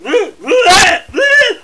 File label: M Get Up